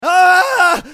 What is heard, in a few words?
usec fall; eft; scales